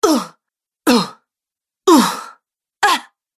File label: Female Fighter Grunts Collection; Grunt Sound Effects